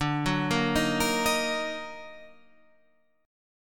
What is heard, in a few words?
Eb Minor Major 7th D, chord